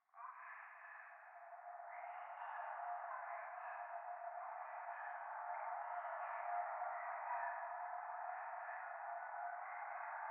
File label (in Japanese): 廃墟 右耳密着処女喘ぎ 車の中